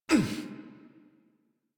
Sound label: Pain, Adult; Warframe; New War; Adult Operator